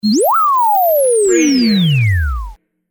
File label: button